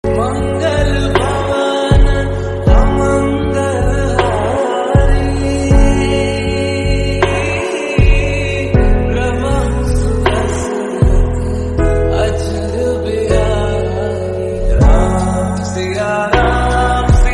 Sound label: Lofi Hindi Devotional Ringtone